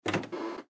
Television Armoire, Foley Television Armoire Door Open Squeak; Miscellaneous Door; Blastwave